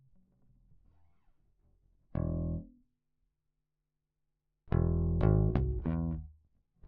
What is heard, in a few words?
Bass